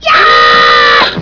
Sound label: YELL